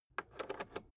rotary control full turn

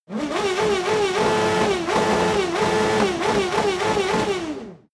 racing car f1 rev brutal distant

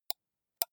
computer mouse left click single slow

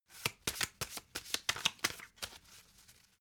playing cards shuffle regular

